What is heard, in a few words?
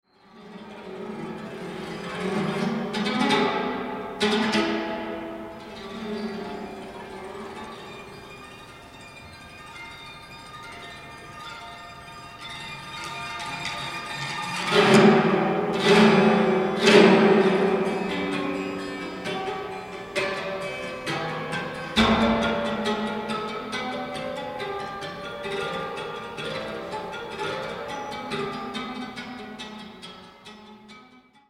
Harmonic Canon Solo